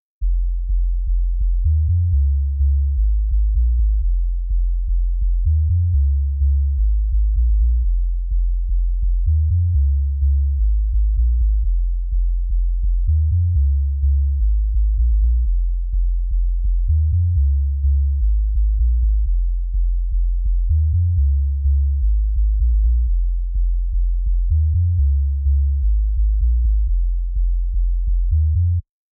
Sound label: Sub Bass, 126 BPM G; Big EDM; Future House Tools; Construction Kits; Twerk It